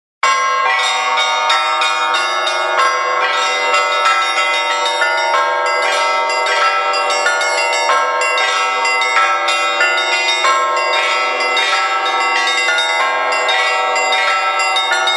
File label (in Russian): zvon